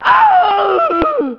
scream male short; ooh ahh

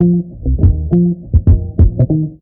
bass, Loops, Phonky

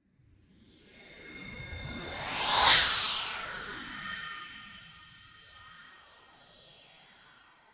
fly by, Zaps and Beeps

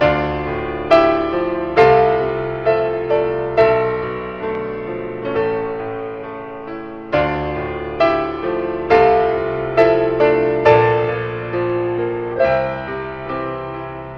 piano, sad loop

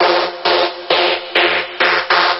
hollow single note repeated; Loops; Electronic

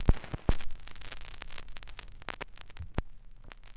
crackles, Vinyl

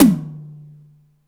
Toms, Real

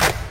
clade, Clap n Snap, Snares

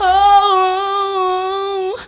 "oh" sung; Voice

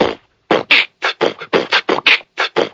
fansic, Beatbox, Drum loops

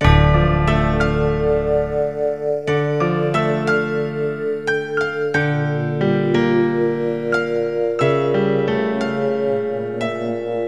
piano, Sad Loops

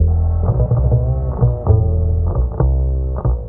bass, Loops, Sad

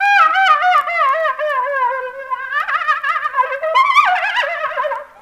laughing horn

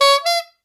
squeeze honk

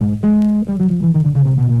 bass chunks, Loops

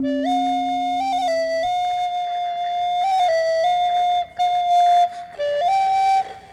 flute weaker, Loops, Jazzmatazz

